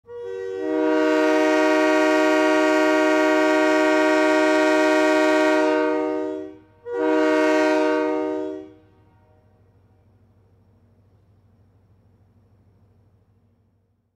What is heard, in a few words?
Train horn